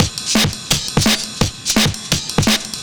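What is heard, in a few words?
Drum and Bass Beat 170, drumnbass 170bpm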